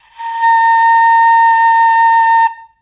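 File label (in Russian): флейта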